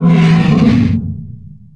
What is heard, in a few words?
hippogryph what; Warcraft III Frozen Throne